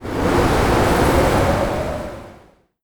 artic; ambient; Stellaris

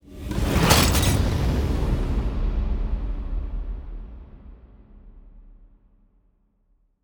ship construction ship move; Stellaris; toxoids; ships

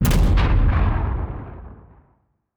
kaiju lightning hit explosion; Stellaris; biogenesis; weapons

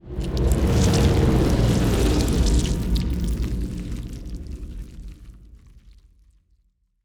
ant ship titan move; biogenesis

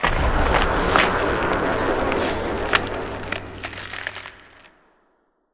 frozen planet; ambient; Stellaris

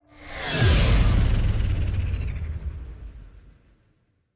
ship move; skrand; Stellaris; paragon; ships

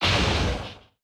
distance arc emitter fire, weapons; Stellaris

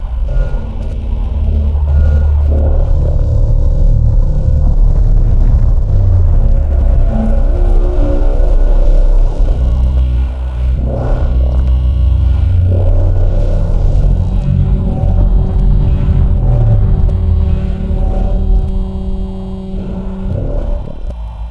think tank structure amb; Stellaris; utopia

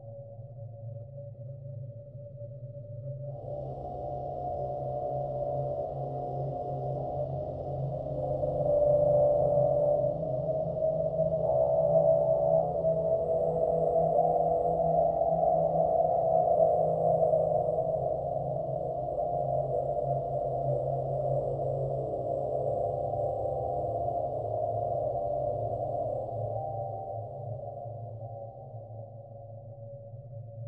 ambient galaxy map; Stellaris

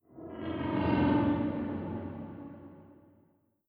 amb city planet flyby; Stellaris; megacorp

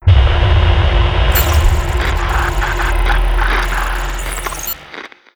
first contact machine; Stellaris; nemesis

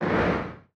distance crystal projectile hit, weapons; Stellaris

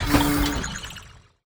specimen acquire; Stellaris; grand archive

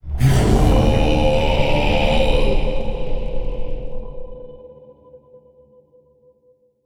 lithoid levitating; lithoid portrait; Stellaris

